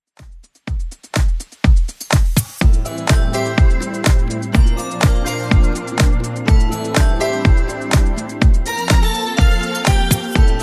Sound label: 80s Club Edit 124bpm; remixplanet